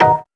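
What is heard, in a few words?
organ chord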